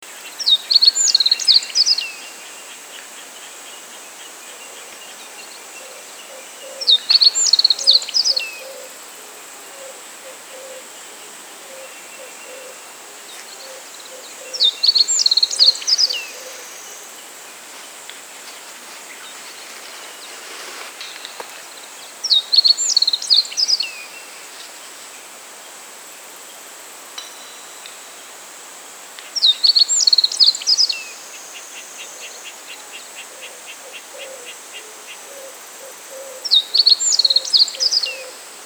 Emberiza cioides

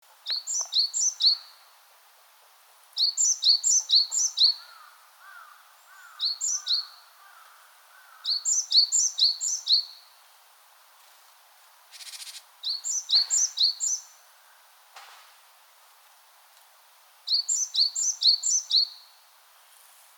Parus major

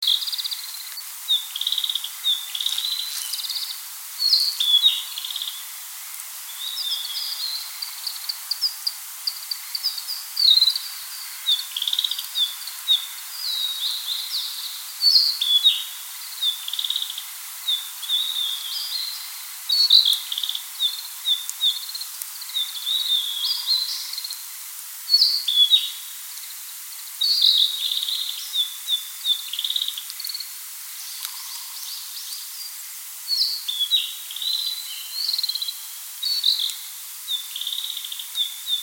Carduelis sinica Cyanoptila cyanomelana

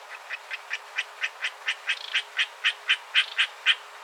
Hyla japonica

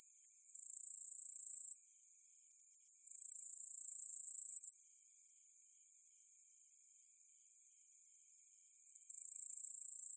Tettigonia yama

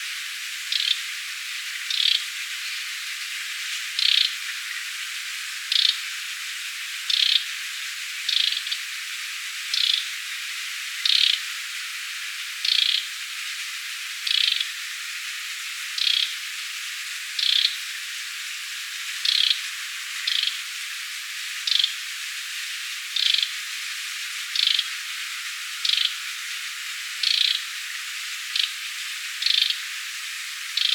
Lanius bucephalus

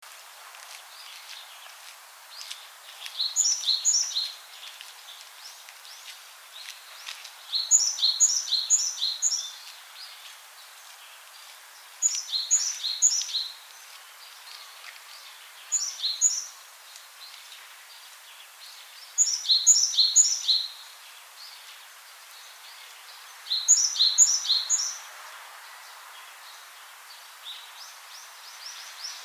Parus major

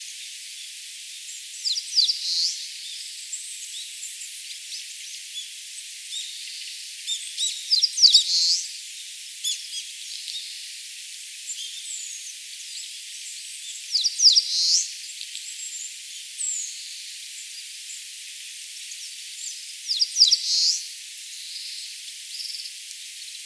Phylloscopus coronatus

